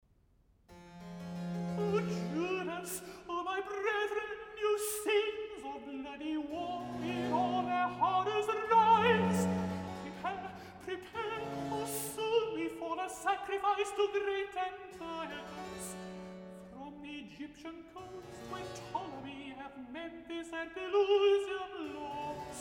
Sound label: Recitative (Messenger countertenor); George Frederick Handel; Judas Maccabaeus 1746; Act 2; Oh Judas, oh my brethren!